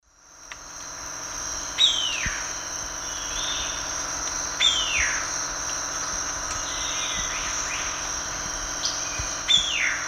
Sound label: Frederickena unduligera, alarm call; S. America; Eastern Lowlands